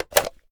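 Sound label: sniper reload empty fast mag out; gmod; iw8; alpha50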